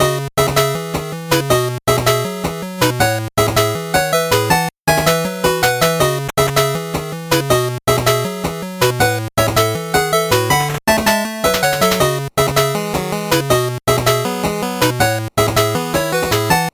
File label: 8bit bgm slow